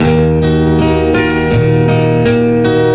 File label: guitar, Loops, Sad